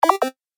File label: Retro Gaming Sound; Video Game Sound Effects